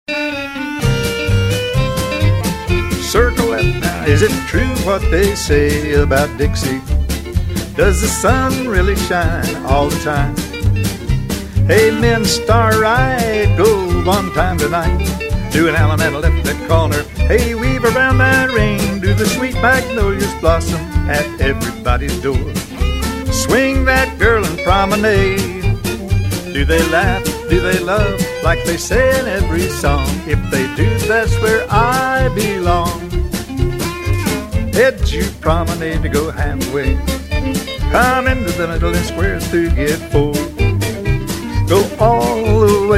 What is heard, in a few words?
vocal sample